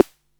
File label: SD3, Electro-Harmonix DRM-32